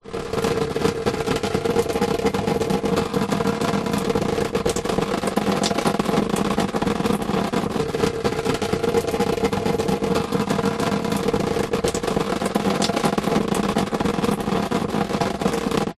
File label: foley plastic tub upside down water trickle drips recorded inside